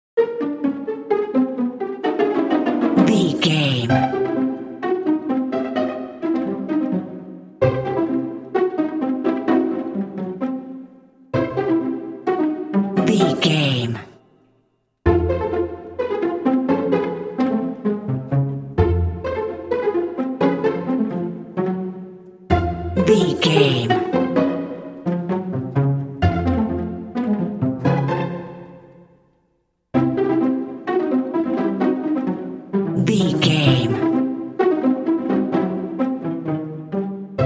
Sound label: silly fun strings only